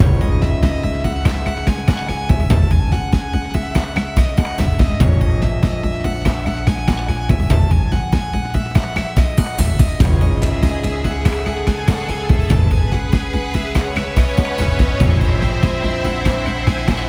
Evil Dungeon Opening cue

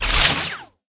Baddie spawn

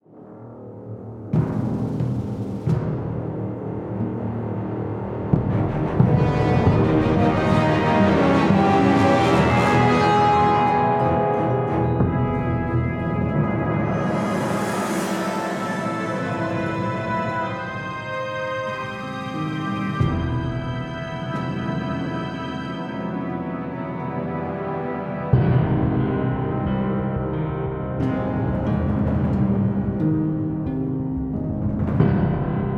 Tension

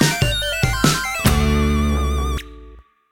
Victory Sting; Starvsevil

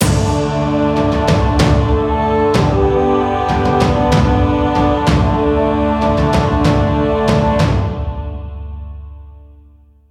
Mission Completed Battle No Cheer; Cross of the Dutchman

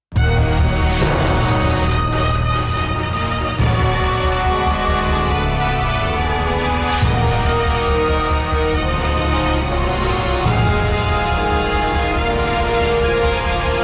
Monastery Boss Loop; Infinity Blade 3

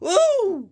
clown throw; Ringling Bros. and Barnum & Bailey; Wii

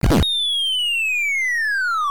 Falling; Lizard Wizard

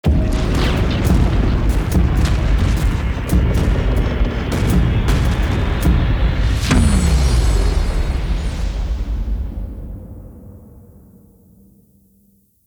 Undead Defeat; Crystal Crisis

